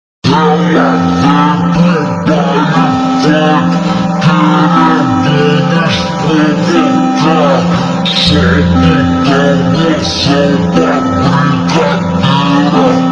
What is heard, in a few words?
funk ultra slowed